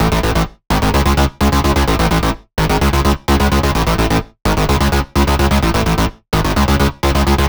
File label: 128BPM Melody; Vengeance Samples; Timebomb Kit